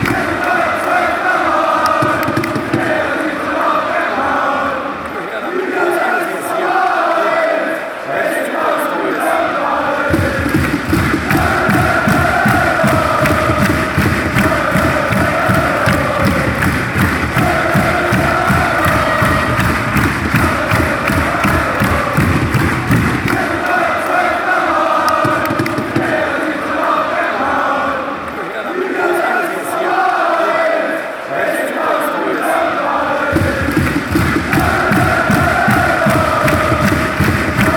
green and white fanchants

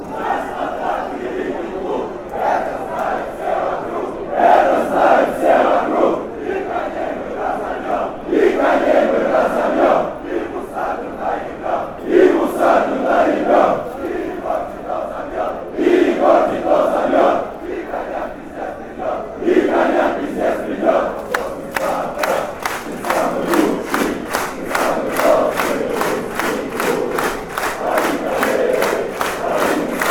our spartak great club fanchants

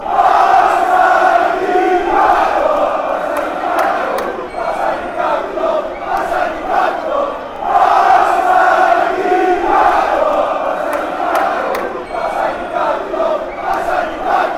den haag chant

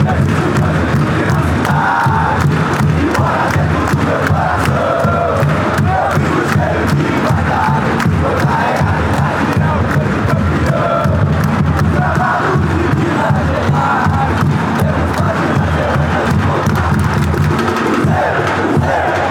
hino fan chants; do cruzeiro

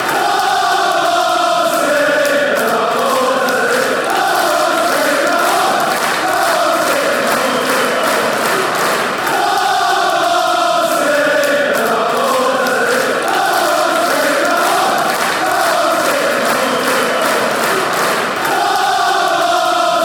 come city, chants; swansea

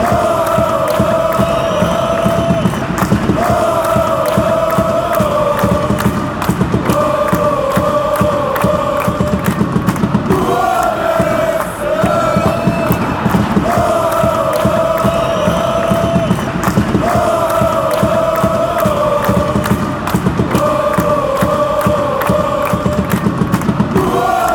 oh only, chants

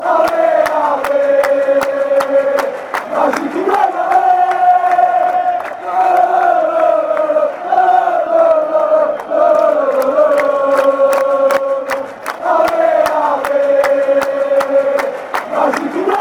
allez magic braga, chants

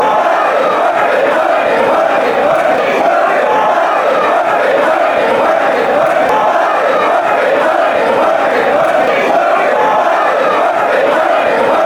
chants; strong

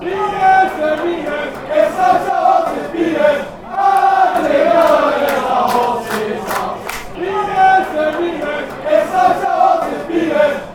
kilmarnock chant

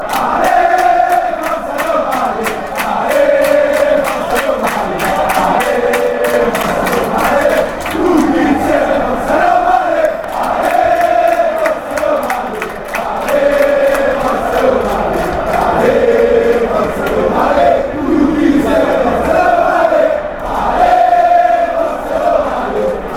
ale come on, fan chants; roma

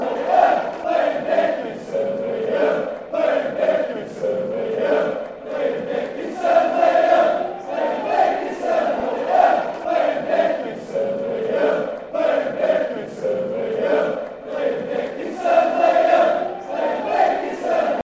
fan chants; liam dickinson

